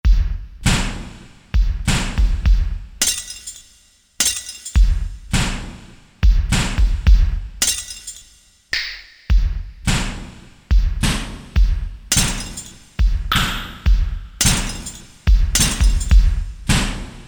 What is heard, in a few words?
NEW KIT, Drum Kits, Korg DSS-1